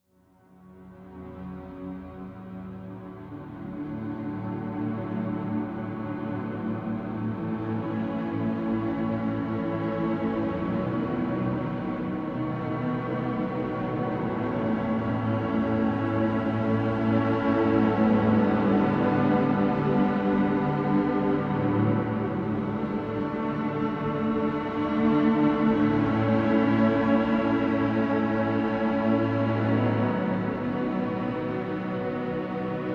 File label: Guitar Pads